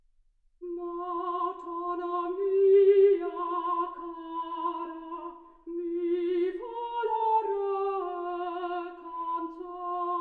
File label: soprano